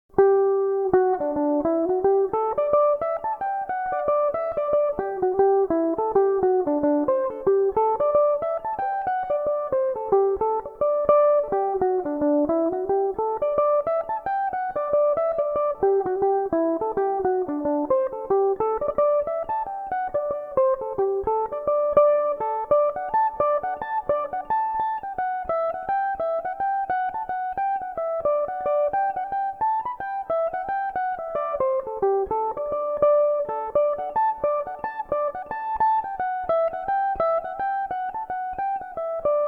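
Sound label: D mixolydian, irish mandolin, tunes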